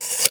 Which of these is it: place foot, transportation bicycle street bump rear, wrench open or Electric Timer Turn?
Electric Timer Turn